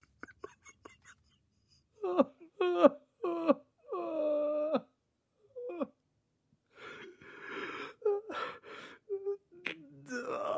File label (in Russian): Звук мужика как плачет